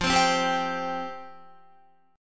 F Suspended 2nd F Guitar; Fsus2; strummed

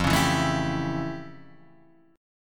F Augmented Major 9th F

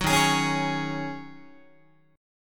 D sharp Minor 11th D sharp Guitar, chord; strummed; JGuitar

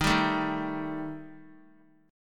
D sharp Major Flat 5th; strummed; D#Mb5; 27,26,24,25; JGuitar

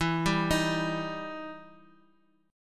E Minor Major 7th E down

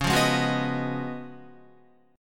C sharp 11th C sharp, Guitar; strummed; C#11; JGuitar

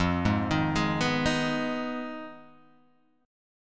F Minor 7th Flat 5th F down Guitar Half Step Down

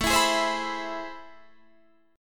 A Minor Major 9th, down, Guitar, Half Step Down

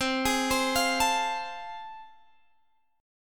A Minor Major 7th Sharp 5th, C

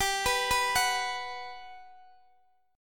G Major 7th, Guitar, Open D; down

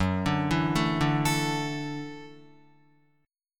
Gb Minor 7th Sharp 5th, Guitar; arpeggiated; JGuitar

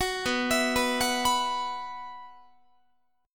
B Suspended 4th, Guitar; Bsus4; arpeggiated

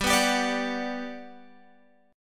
G Major 7th, G, down, Guitar, Open G

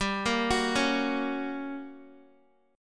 G Minor Major 7th Flat 5th G, Guitar; arpeggiated; x,10,10,11,13,x; 4F,4A,5C,5G,5C,6E

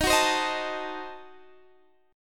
D sharp Diminished 7th D sharp Guitar Drop D

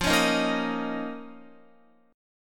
F 7th Suspended 4th Sharp 5th F down Guitar; strummed

